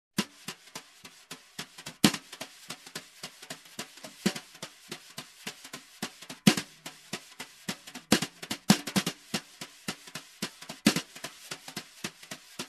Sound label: Brushed jazz drum groove